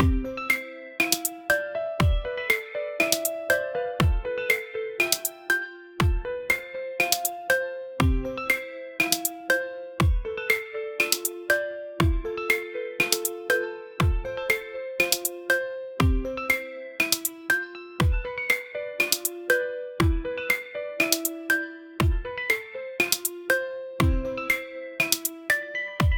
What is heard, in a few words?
casual mobile game main